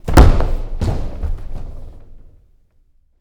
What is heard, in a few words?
Boxing Ring Bodyfall